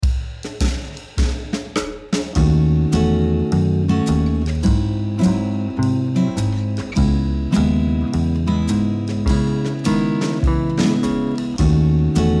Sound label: two five one D flat minor